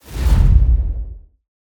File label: Just Whoosh; SoundBits